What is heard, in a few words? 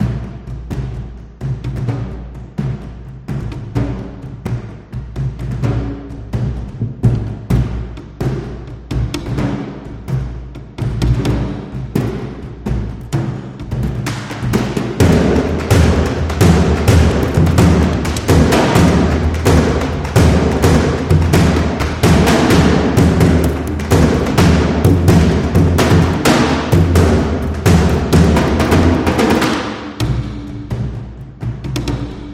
Ancient Egypt